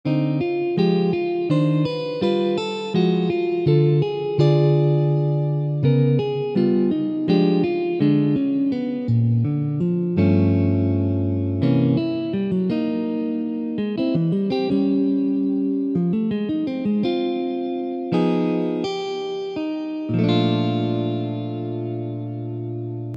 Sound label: etude, jazz guitar